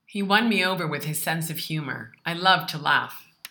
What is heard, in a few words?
laugh; conquistar